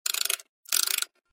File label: see saw; Rolling-Panda